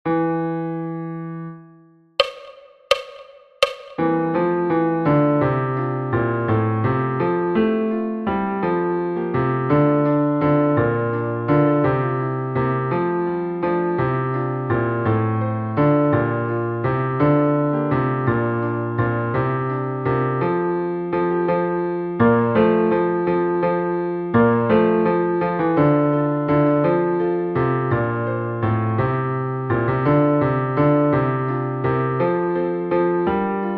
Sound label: bass; Ye Banks and Braes